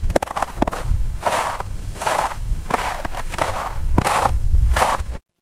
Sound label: footsteps snow